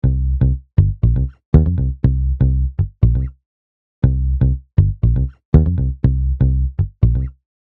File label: Bass